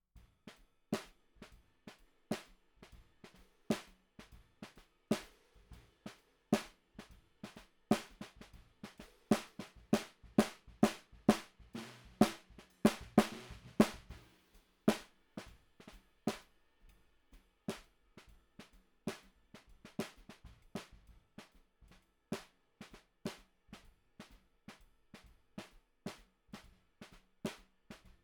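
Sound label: Snare Bottom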